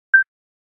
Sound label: Beep; Microsoft; Lumia-640